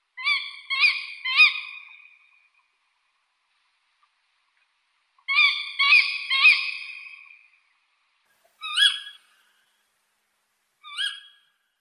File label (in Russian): nejasyt seraja golodnyj sletok